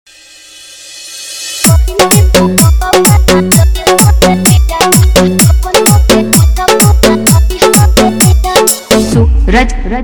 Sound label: Bhojpuri Dj Remix Song